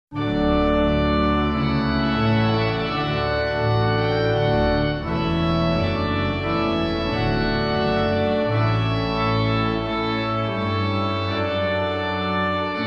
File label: Trumpet Voluntary